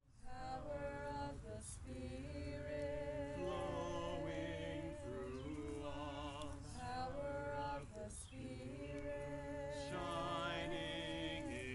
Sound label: chant